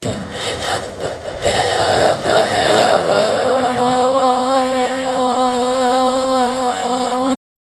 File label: jingle